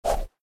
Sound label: player dash; Adventure Time - Break The Worm; ThrillGames